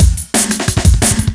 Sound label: Bronx Break, Jungle Breaks; Blu Mar Ten - Jungle Jungle - 1989 to 1999 Samplepack